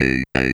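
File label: synth, Drum & Bass, 175 BPM; Ueberschall; Drum & Bass Flash Trackz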